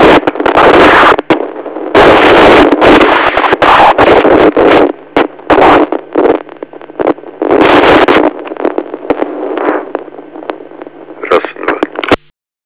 vhf

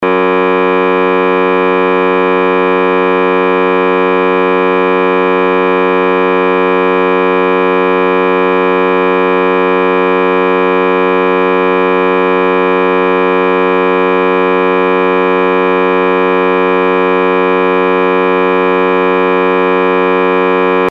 NFM

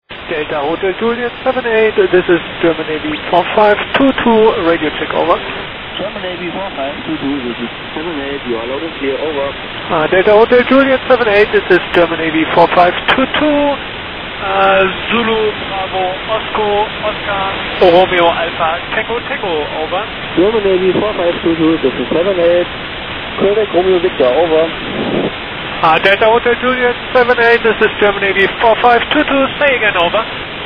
german navy

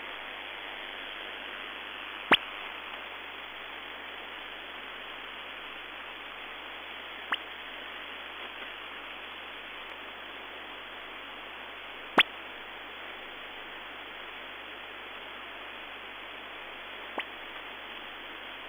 chirp; near 11200 mhz

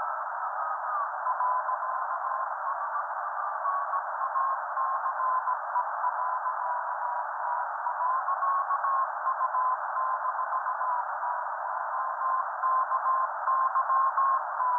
cw beacon; co-57

